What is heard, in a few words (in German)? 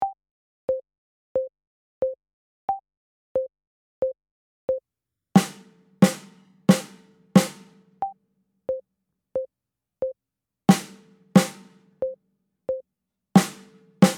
Duett Snare mit Click